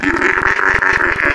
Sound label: Goat, Wavetables; Cymatics; Serum; Animals for Serum - Gold Edition